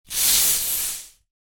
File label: soda fizz